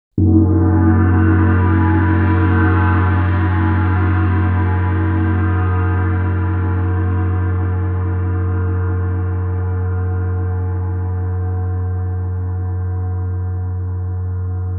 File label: 28 Sweet, Gongs